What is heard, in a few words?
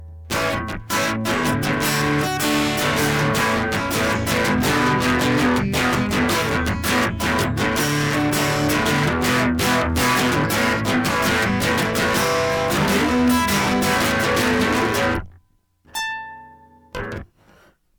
electric